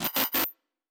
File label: Glitch, Electric; Sci-Fi Sounds